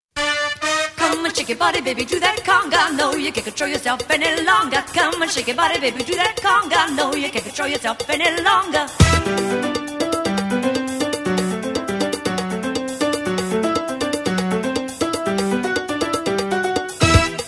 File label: CONGA